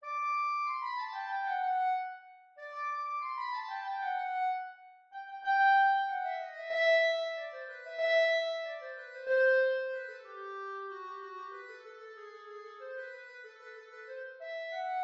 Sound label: Clarinet Excerpt